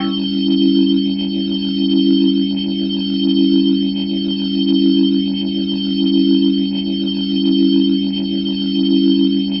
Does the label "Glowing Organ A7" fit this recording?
Yes